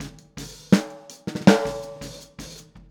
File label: Boogaloo Fill Snare, 82 BPM, Drums; Sonic Foundry (Sony Creative Software) - Drums from the Big Room The Mixes